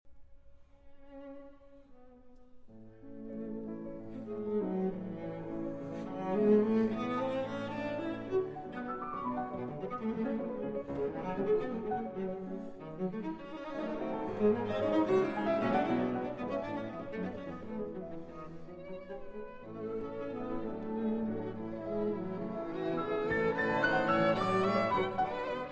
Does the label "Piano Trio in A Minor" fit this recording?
Yes